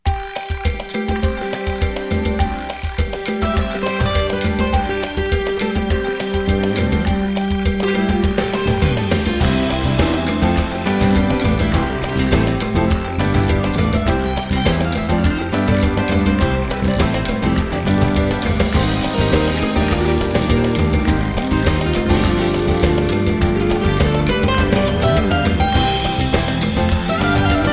Island Vibes

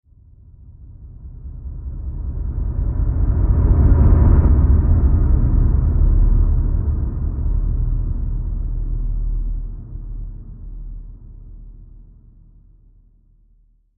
Silent Threat Low Sub Whoosh

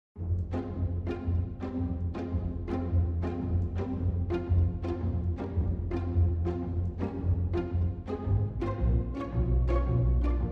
Short Strings

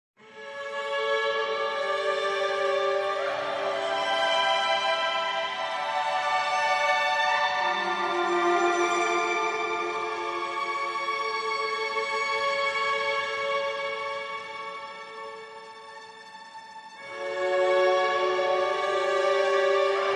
High Strings Only

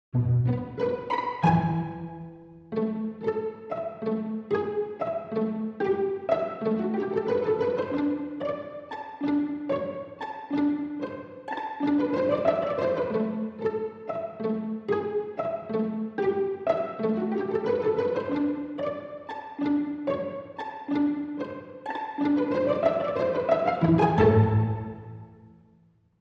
Strings Only

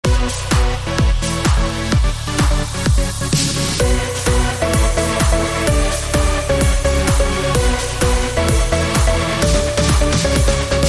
Boost the Bass

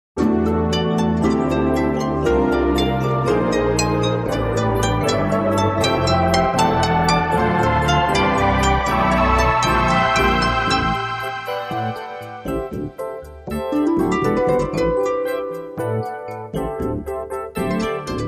Cosmic Jazz

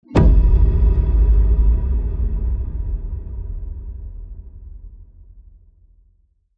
Low Deep Hit

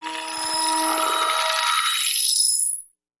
Sharp Transition

